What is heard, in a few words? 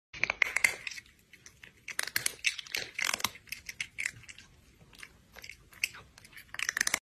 Crunchy cat